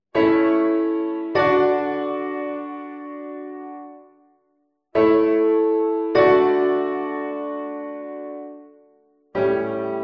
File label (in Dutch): vierstemmige zetting; Links staat telkens een voorbeeld van verkeerde verdubbeling, rechts een verbeterde versie.